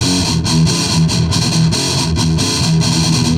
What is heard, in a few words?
Riff, Industrial; Nasty